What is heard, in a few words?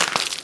Damage blood spatter; Dota 2